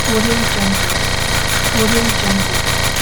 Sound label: rattling drilling; urban sounds